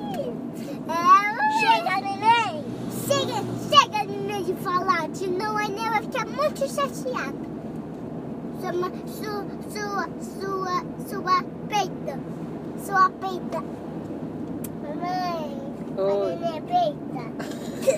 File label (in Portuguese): peido nenem